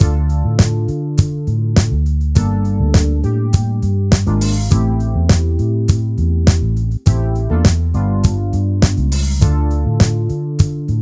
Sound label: funk